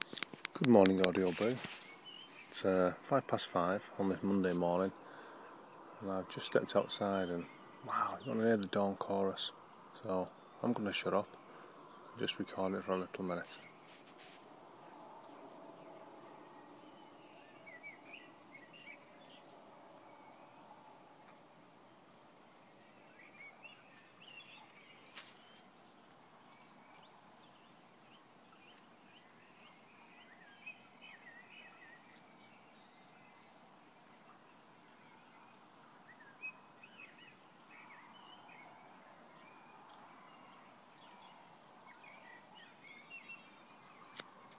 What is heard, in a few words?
dawn chorus 5am